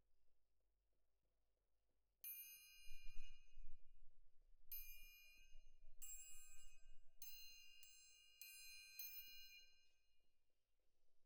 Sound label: Perc Main